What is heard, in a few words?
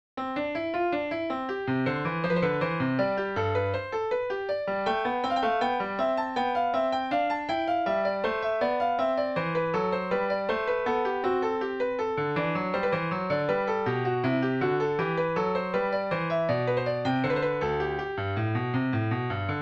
Invention D, piano